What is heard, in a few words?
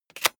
plastic device handling clack push